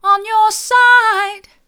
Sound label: hard, vocal